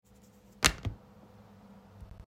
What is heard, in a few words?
card drop; hokm